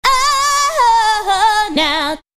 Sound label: "oh oh oh" female SUNG